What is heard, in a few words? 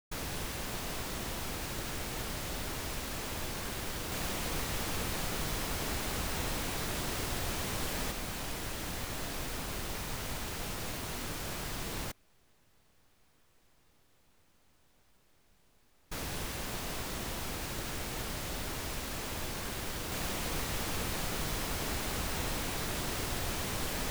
Surround Sound Test Tone; Denon Jazz Sampler plus The Audio Tool Box